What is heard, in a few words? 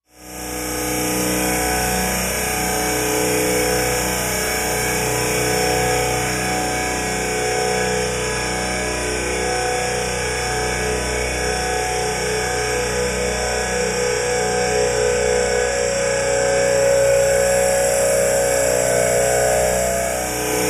Constant Pressure Mac, mechanical